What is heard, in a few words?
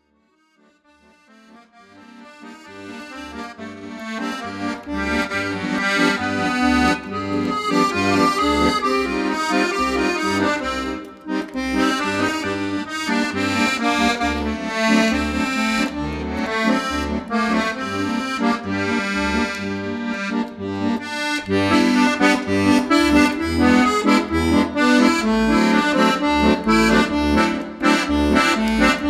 improvisation; beguin